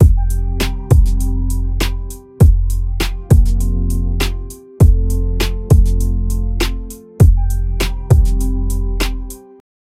chill hip hop beat with, lo fi

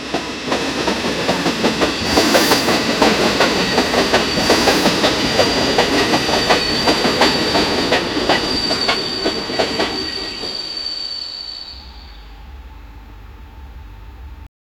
a steam train that comes; disaster

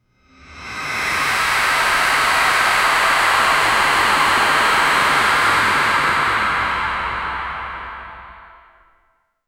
silent room ambience; psychological

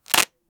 short sound effect, cards

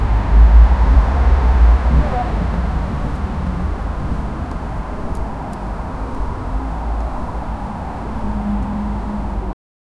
urban street ambience at, night

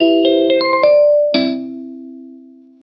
anklet jingle, jewelry

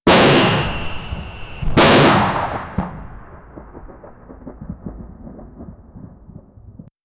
bombing and gunfire sound; chaotic

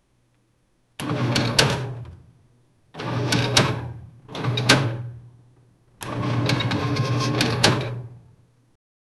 mechanical machine insert, gear

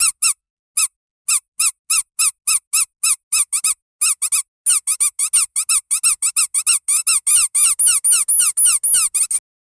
silly rubber duck squeak

